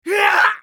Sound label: scav wound; eft